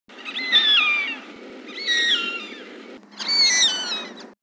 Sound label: Dendrocygna arborea females